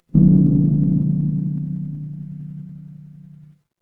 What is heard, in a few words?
instrument gong